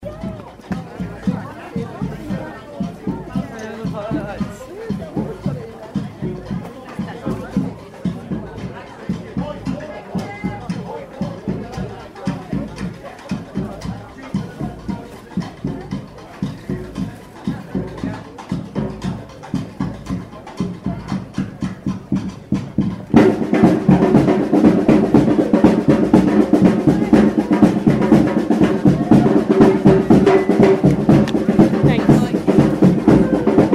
drums; Victorian Fayre; lamp post

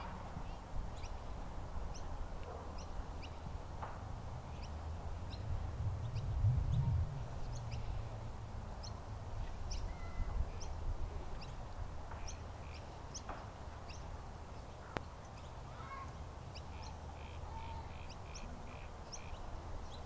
chirp chirp

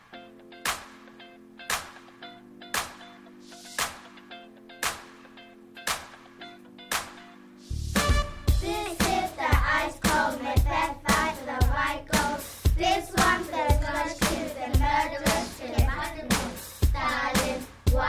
Macbeth rap

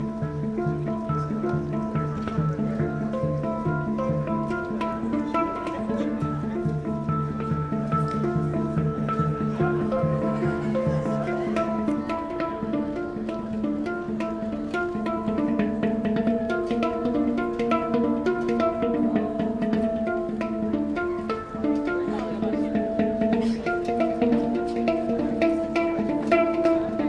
guy playing the hang drum; in bath

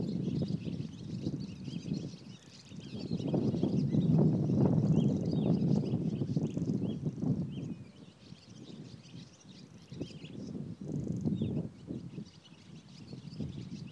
the sound of summer the sound of swallows

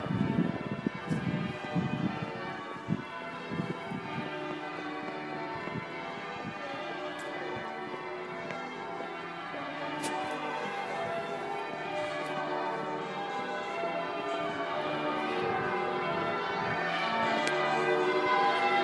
lincoln cathedral bells; lincscathedral

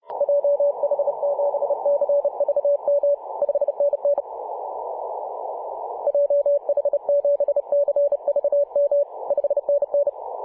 21.020 MHz cw; c21eu

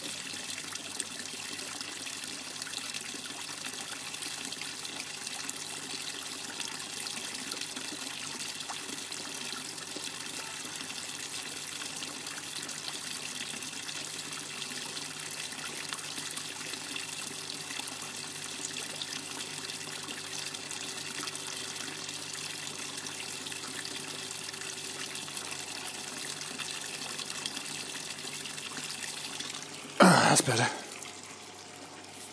hehe

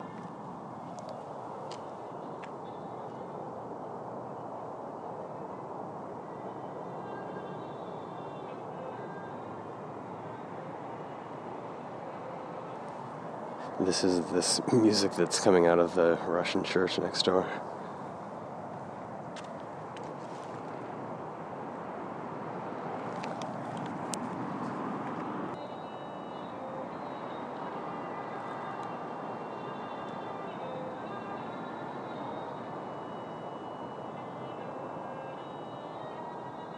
violin nighttime magic

